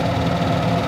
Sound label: Loop, Engine, golfcart